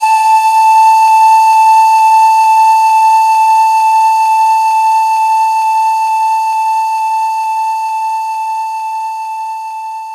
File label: Pan Flute